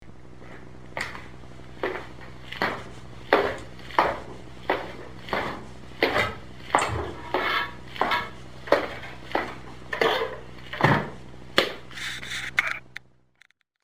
Treppe runter

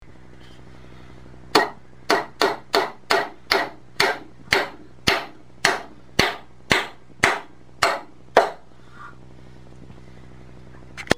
Haemmern

